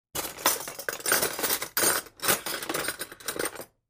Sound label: Silverware Drawer Sort Through, Kitchen